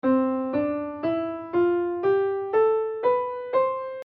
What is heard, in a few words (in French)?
gamme piano